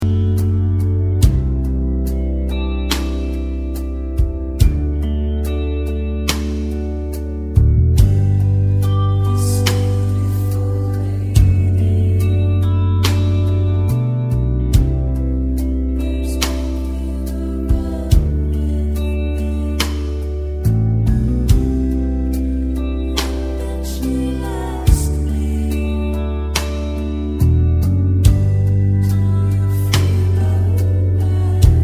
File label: Lead Guitar